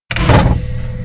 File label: sentry deploy; svencontra2; stage1